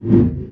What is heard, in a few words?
MENU SLID; wipeout